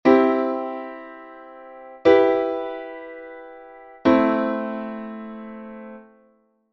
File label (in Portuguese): triades M e m; musicologia